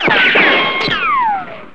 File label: explosion; CD Action 28b; east front